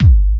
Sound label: deep dance kick; Kicks; single hits